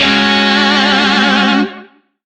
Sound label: Shawzin Yo Chord G; Music; DE; Warframe; Lizzie Shawzin